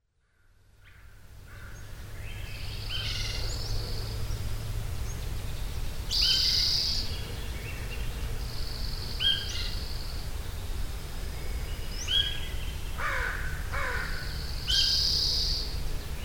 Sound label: Afternoon Birds